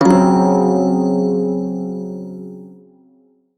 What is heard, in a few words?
Shawzin Hirajoshi Chord; B; Warframe